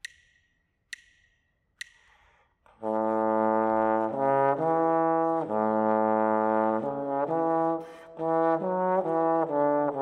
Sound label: partially completed melodic dictation